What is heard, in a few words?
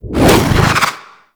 Ember Cast; Warframe